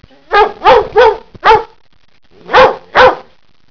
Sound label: BARK